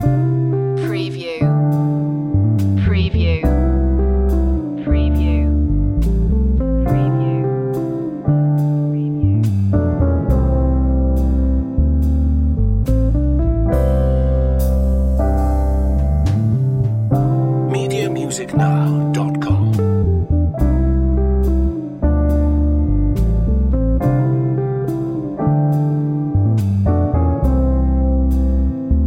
jazzy love loop

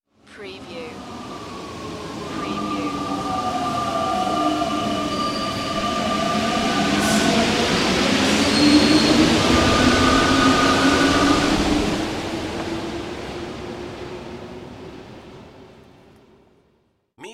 Metro Train Pass By

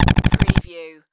Pulse Rifle Machine

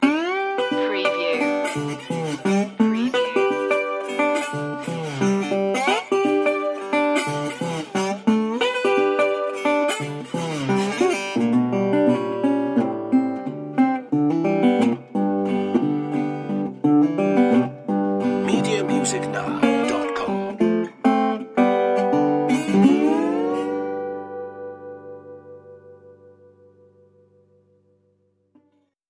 blues

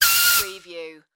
air compressor release; sound effects bible

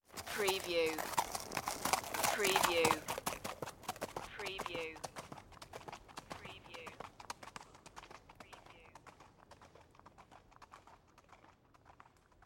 Horse Gallop Ride Away; Sound Effects Bible

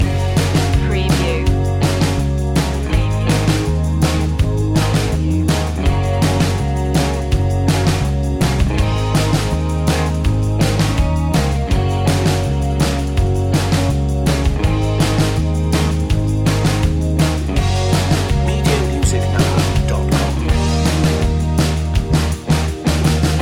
breakin waves loop

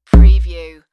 Bass Doing

